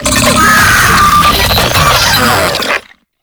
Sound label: Proto Infested Brachiolyst Idle, Vocals, Enemies; Warframe